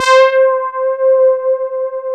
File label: DS8 C 5, Korg; Back In Time Records; Korg Universe VOL-1